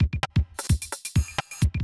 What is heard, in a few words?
electro kit 130